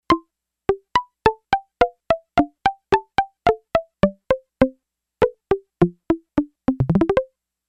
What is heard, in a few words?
Pizz. 2, Yamaha DX11; Factory Preset Voices